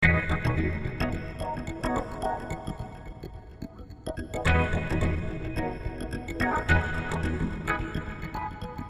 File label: Swinglefunk D 108